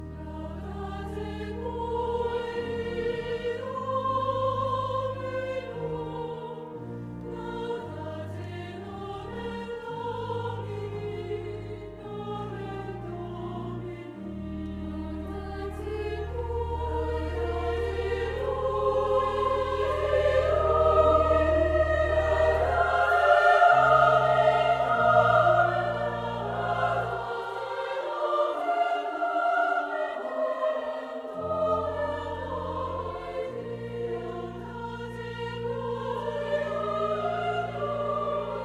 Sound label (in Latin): LAUDATE PUERI DOMINUM